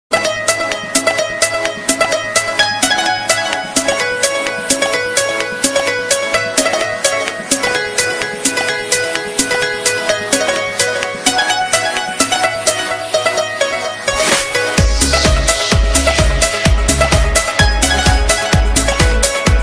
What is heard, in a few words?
best tone